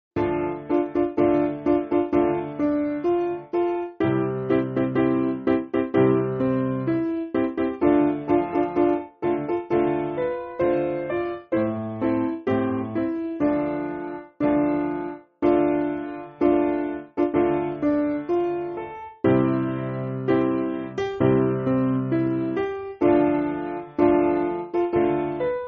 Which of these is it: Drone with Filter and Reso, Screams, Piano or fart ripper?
Piano